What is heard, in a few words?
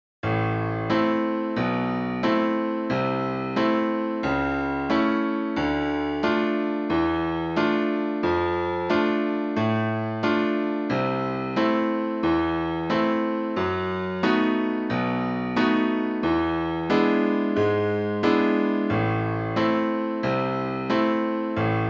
piano